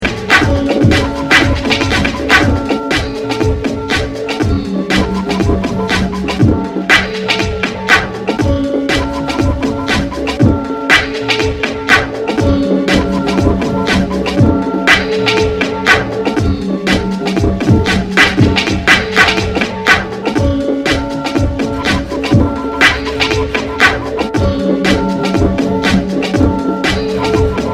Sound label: Gamelan House